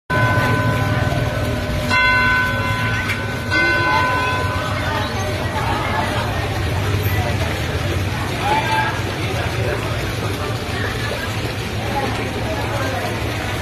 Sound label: Cathedral Vank, زنگ کلیسای; کلیسای وانک